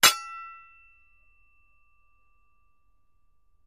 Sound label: Axe Clashes With Ring Outs; Light Axe Clink With Scrape And Light Ring Out. - Metal Scrape